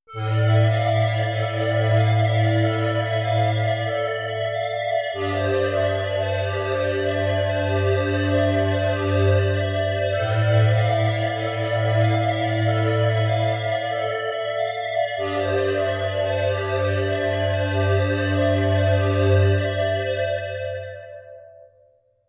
Square Hollow

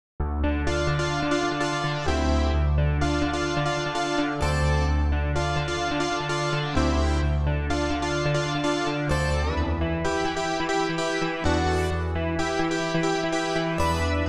Bright Synth

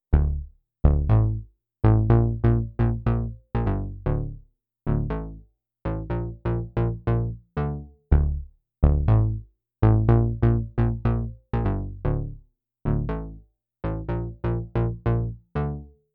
Fat Line Bass

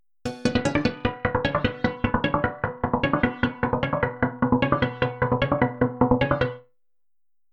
Blip

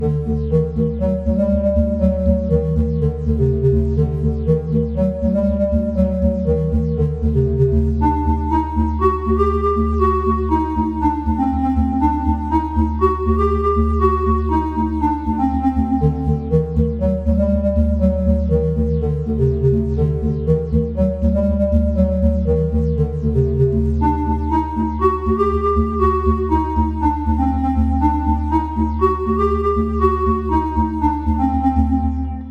ring sines

